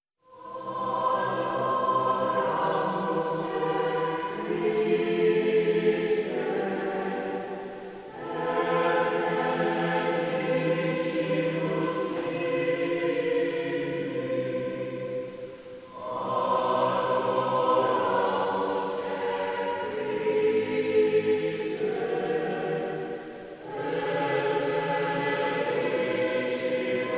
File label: adoramus te